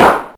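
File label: DRUMTRAKS clap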